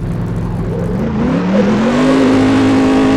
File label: first, vehicles